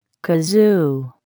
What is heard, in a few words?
kazoo